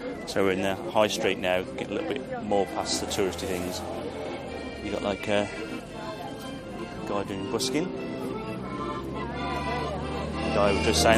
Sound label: very talented accordion player; uglich russia